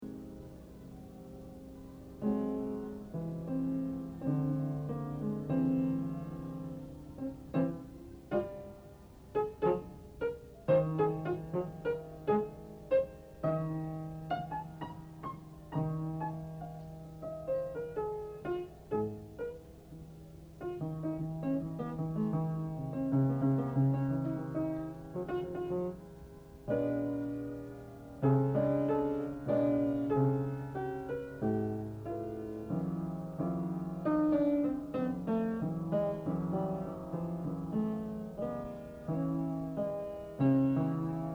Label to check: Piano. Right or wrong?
Right